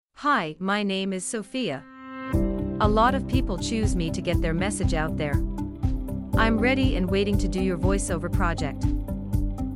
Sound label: Inspiration Music